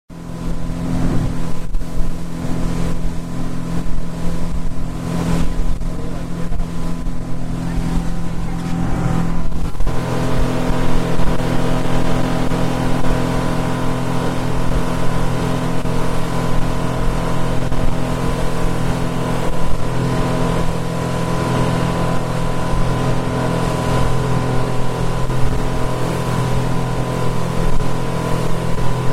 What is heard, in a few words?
boat; ocean